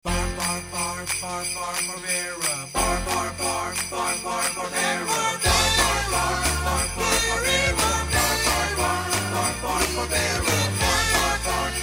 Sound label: HOLIDAY MUSIC